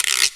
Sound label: RATTLE; Drum Hits